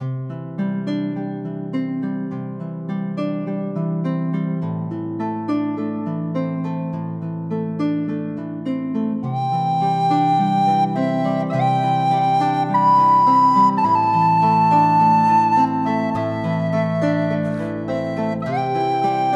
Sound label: String Experiment